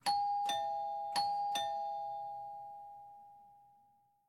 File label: Intercom; LOOPERS